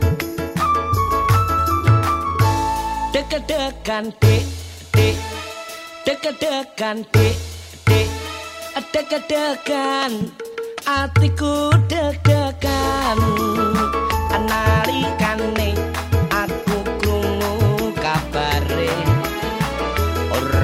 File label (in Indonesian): nada dering jawa; dewanvision